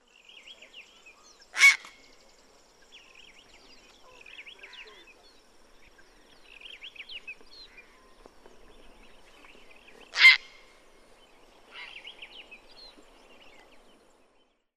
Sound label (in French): cri du héron cendré